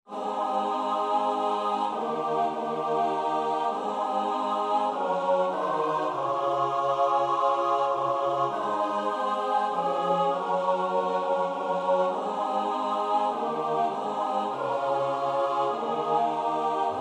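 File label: soprano alto tenor bass; Nanino (Giovanni Bernardino)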